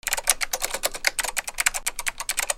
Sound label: OFFICE